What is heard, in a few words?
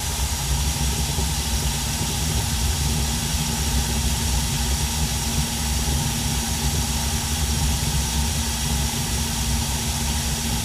Gas Furnace Idle